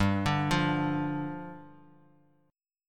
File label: D Minor Double Flat 5th, G